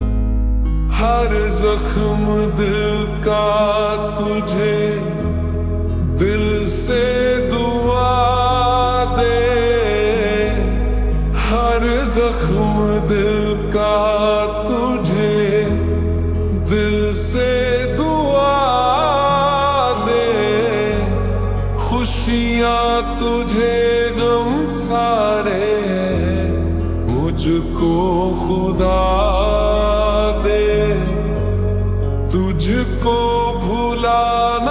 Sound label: Ringtone Slowed Reverb